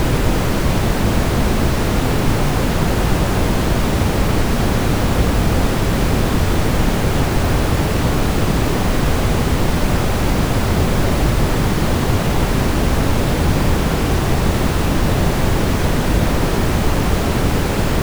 Brown Noise; Burn-In Man

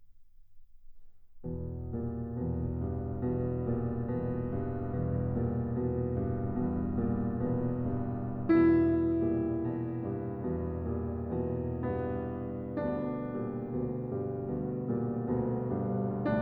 Prelude in A minor, Piano; Chopin; Preludes

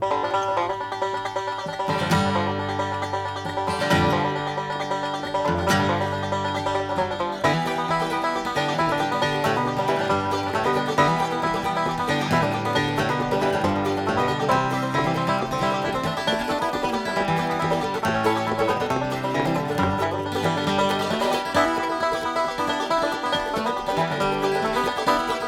Instrumental Version

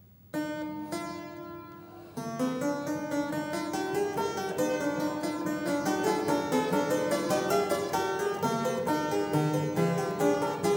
Fugue in E; Fugue 9; BWV 854; Bach - WTC - Tilney

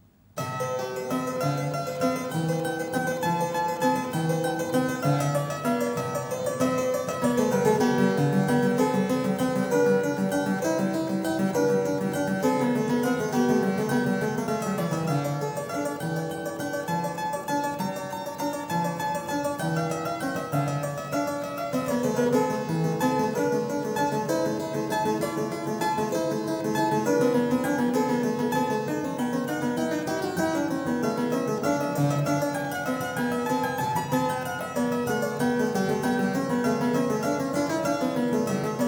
Prelude in C sharp; Prelude 3; BWV 848; Bach - WTC - Tilney

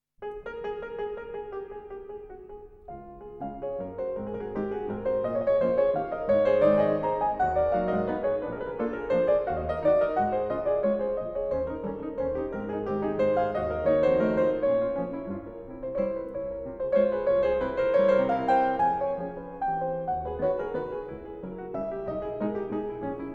3 Nouvelles Études Nr. 3 Des-Dur, The Piano Works; Chopin

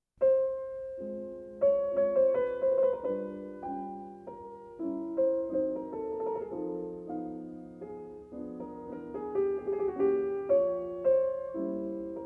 Mazurka No.49 in F minor, piano

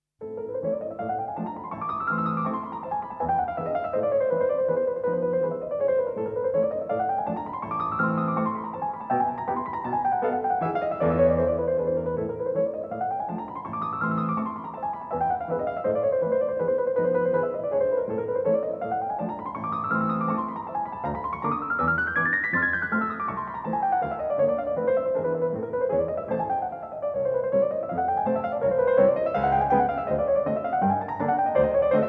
in a Minor, Piano

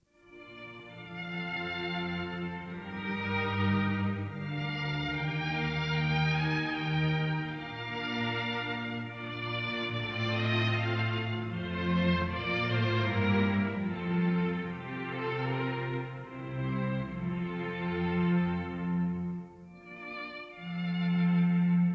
Suite For Strings, Flutes & Horns; Barbirolli - Edition; Purcell

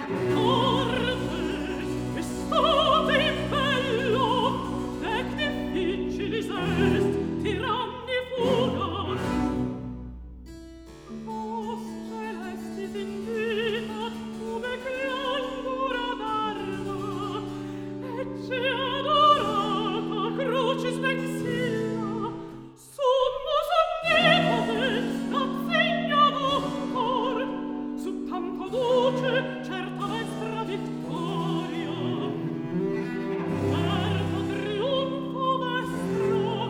Recitativo; Antonio Vivaldi (1678 - 1741); Vivaldi - Mottetti (2003)

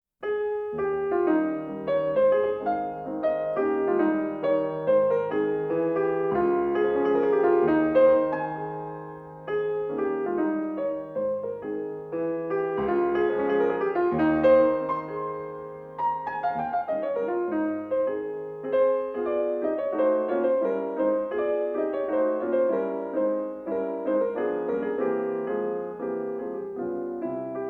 Mazurka N 16 in A major, Op.24-N 3, Chopin Piano

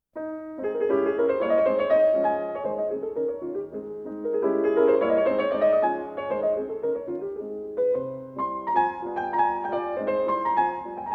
Mazurka in A flat major, Piano

